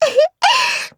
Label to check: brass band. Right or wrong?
Wrong